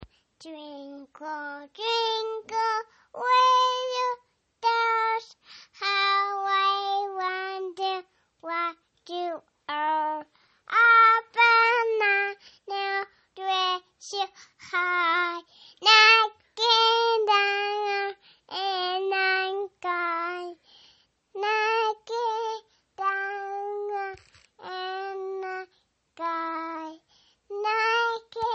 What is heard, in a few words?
song